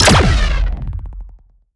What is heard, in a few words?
Parry Large Blaster Distorted Cruncher